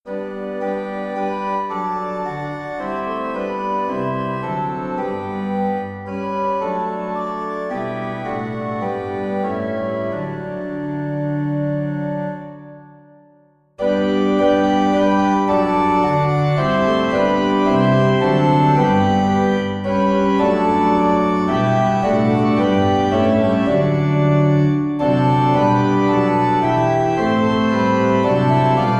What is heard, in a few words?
Organ